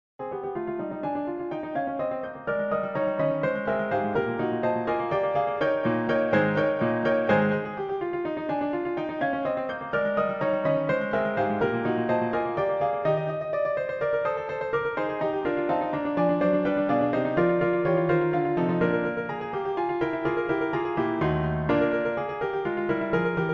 Pianokonzert; ala Brahms

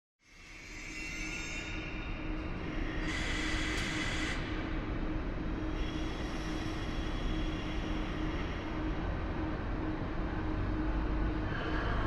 Soundkulisse Bahnhofshalle

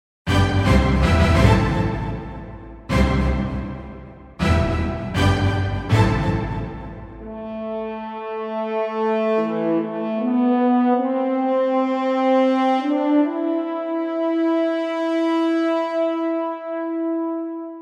Orchestertusch und Hornsignal; Orchestratusch Hornsignal (0:22

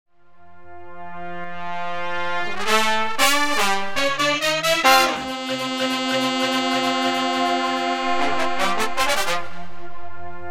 Trompeten Fanfare

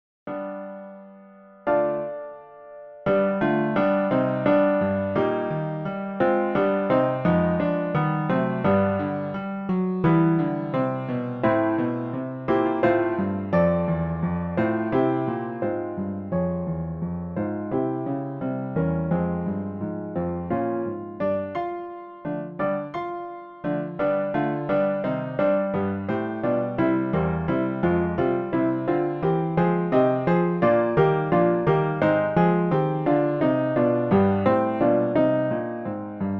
schnelle Piano Requiem

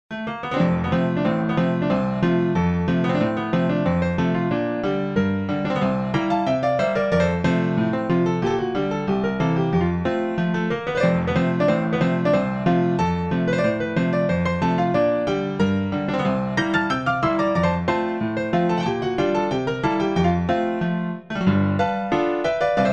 Variation ungarischer Tanz

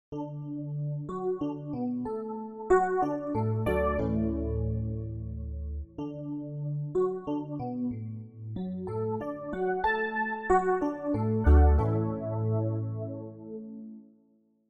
Klassik Intro; Light2Art

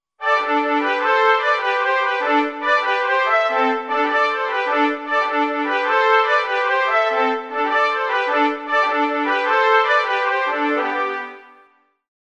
Hoerner Fanfare; Beethovens Hörner Fanfare (0:14

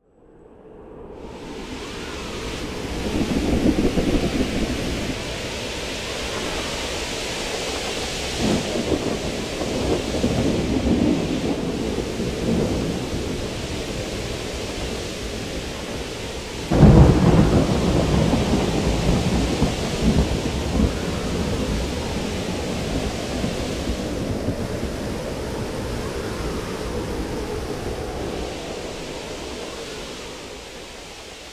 Sturm Regen Gewitter

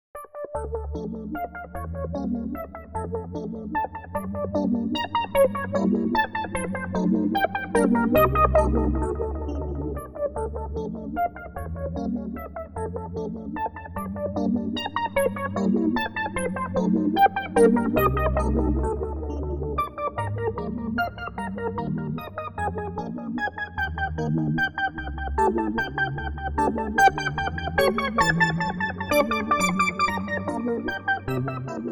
Pueppchen schreit Mama Waltzer

